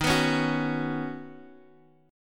D sharp Minor 7th Sharp 5th D sharp, down, Guitar, Open D